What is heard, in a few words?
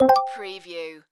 Casino Slot Machine Hit Spin Button